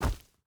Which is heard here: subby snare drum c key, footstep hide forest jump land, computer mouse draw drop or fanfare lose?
footstep hide forest jump land